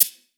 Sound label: Perc Shaker Bright, 909 Percs; Slate